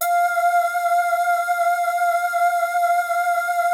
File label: PERU LEAD F4; Music Store - Claudius Diemeter Schwerlos; FINE ART 3